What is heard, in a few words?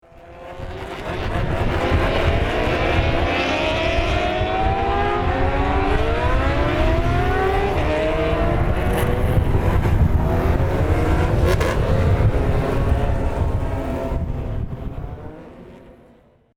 Racing SE